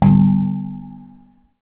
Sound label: match, mahjong; Mahjong Medley